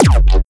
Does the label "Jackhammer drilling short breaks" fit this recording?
No